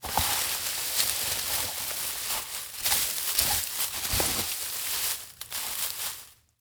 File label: Foley Movement Leaves Digging In Leaves